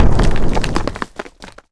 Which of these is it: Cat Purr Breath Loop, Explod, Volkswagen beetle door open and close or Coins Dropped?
Explod